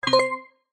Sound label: multimedia game tone synth bright organ style collect item